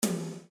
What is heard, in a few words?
instruments drum tom; maoudamashii